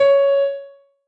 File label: noteblock c sharp 2; mesecons